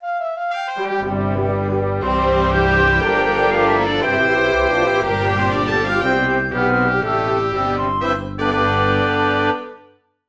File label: medley